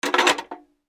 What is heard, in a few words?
Telephone